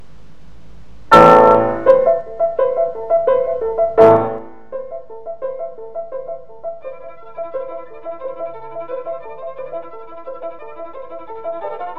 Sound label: Capriccio A moll for violin and piano; Peter Hristoskov